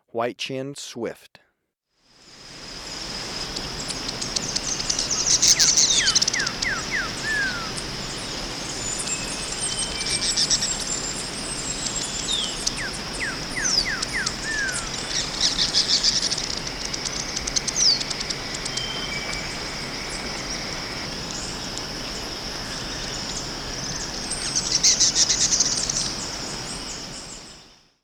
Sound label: White-Chinned Swift, Birds of Ecuador; S. America